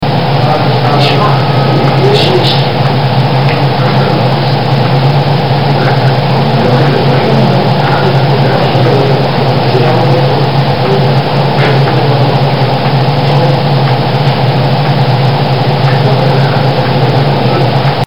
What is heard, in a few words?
noise